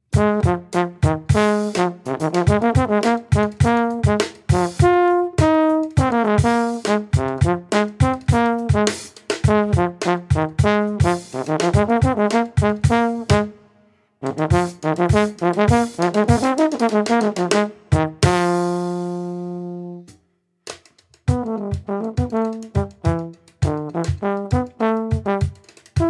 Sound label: Funk